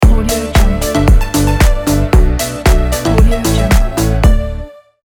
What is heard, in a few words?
tropical summer logo